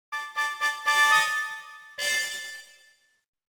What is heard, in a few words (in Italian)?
tensione